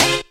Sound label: Dance Hit DbM7; Proteus 2000; Digital Sound Factory Proteus 2000